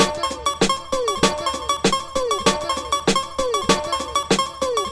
LOST MELODY PATTERN

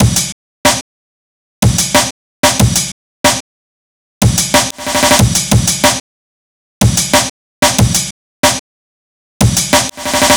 amen break out

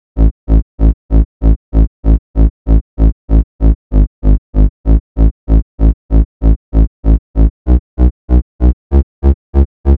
TEK BASS

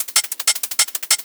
Top Hats

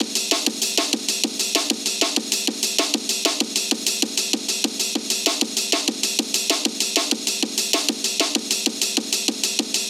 AMEN SUGAR

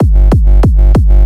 Kick Ass Eurobass

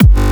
Kick Bass G

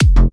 Kick Bass Juice F

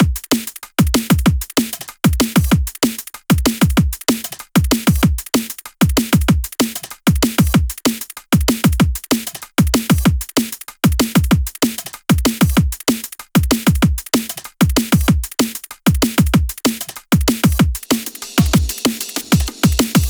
DNB BREAKS

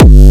Kick Bass G Harder

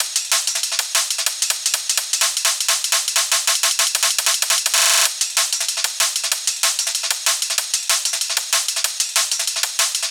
Amen Choppy EQ

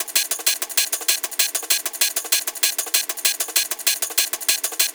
PERC LOOP

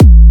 Kick A full note

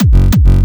Kick Bass F

